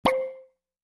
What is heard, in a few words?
Coin; Fancy Pants World 1 Remix